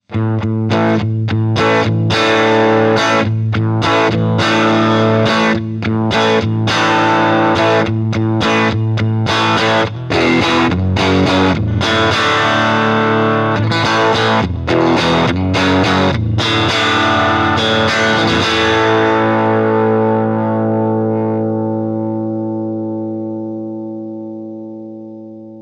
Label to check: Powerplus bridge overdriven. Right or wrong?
Right